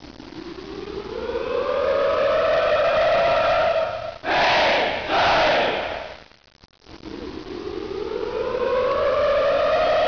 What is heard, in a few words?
Hog Call